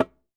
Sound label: Djembe - D#; percussion; Cymatics - Infinity Beta Pack 2.0; Zeus Percussion Collection